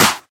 dry hand clap sample c key, Royality free hand clap sound tuned to the C note; single hits one shots